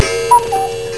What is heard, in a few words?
cuckoo; BOMBER